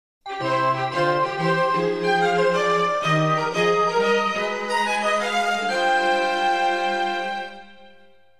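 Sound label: standard SMS on HTC One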